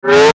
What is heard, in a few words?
Ascend Electrical Short Buzz; Blastwave